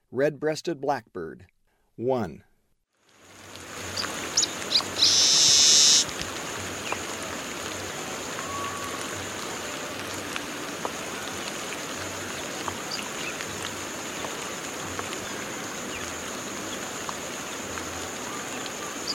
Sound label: Red-breasted Blackbird; S. America; Eastern Lowlands